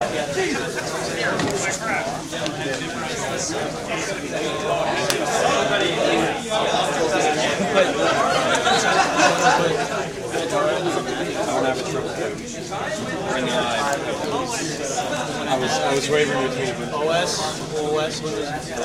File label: crowd; fedora; FUDCon Boston 2006